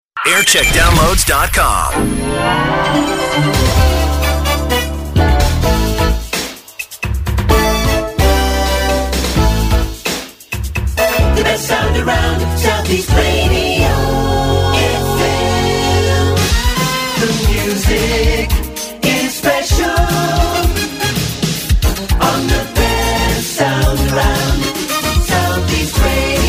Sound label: Jingle Montage; South East Radio; TM Century